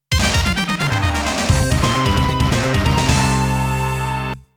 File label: Round Start; Namco Game Sound Express Vol.5; rolling thunder 2